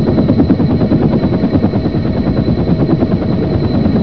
Heli running; cs lakeside

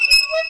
squeak

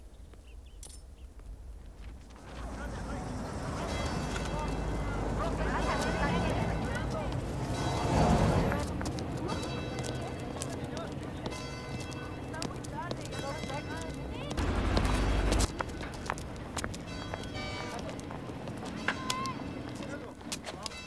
Paris atmo; cs paris

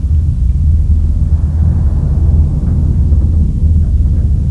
buzzer shum horror

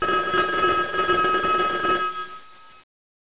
phone; bronx

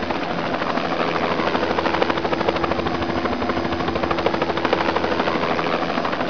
chopper

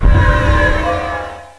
tomes; warcraft3

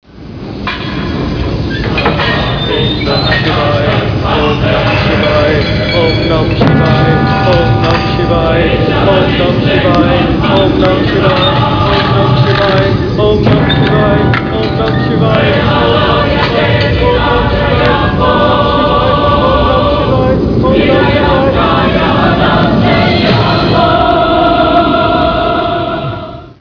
shiva pray

